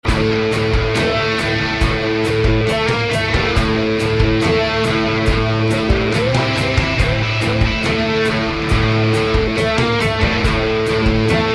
guitar

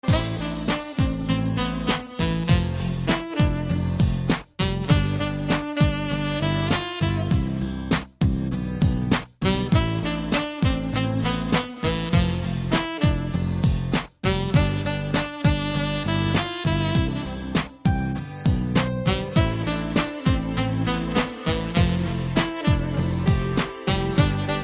saxophone

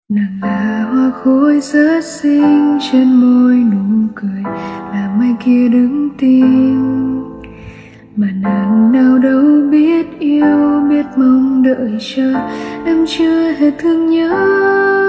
Lofi

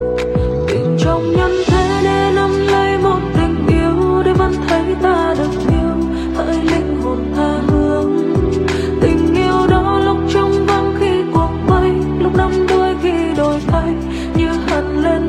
Lofi

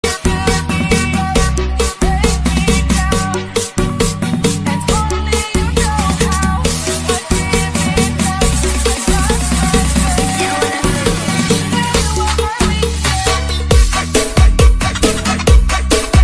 Full Bass

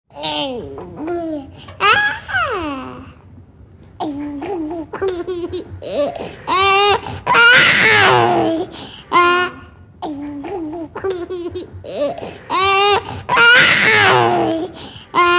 baby talk cry laugh; appviet

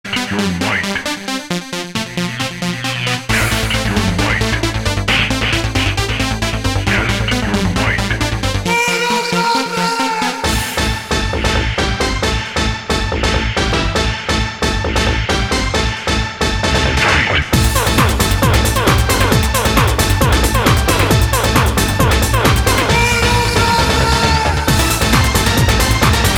aerobic music

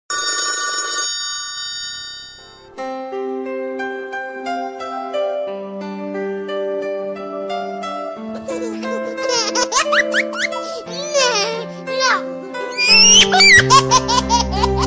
cute ringtone

